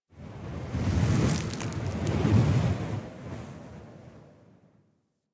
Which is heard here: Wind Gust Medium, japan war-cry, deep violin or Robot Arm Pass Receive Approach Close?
Wind Gust Medium